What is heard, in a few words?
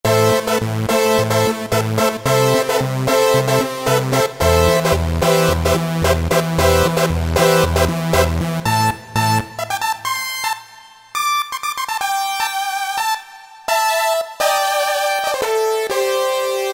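saws, Kurzweil K2000; Kurzweil K2000 Value Pack; Xtra Programs; Jord Vox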